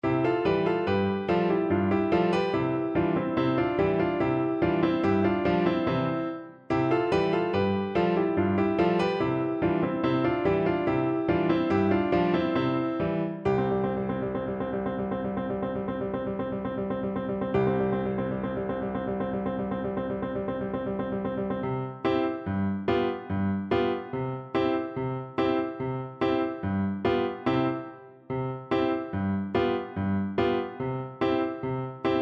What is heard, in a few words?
French Horn; Greek Folk Song